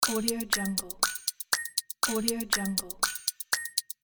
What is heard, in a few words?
game timer